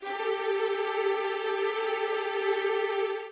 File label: trill